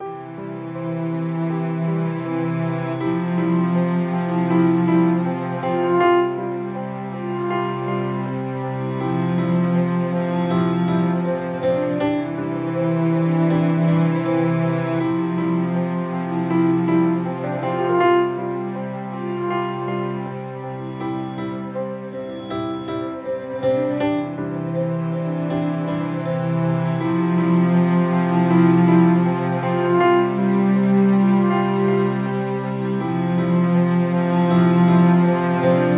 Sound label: Drifting Piano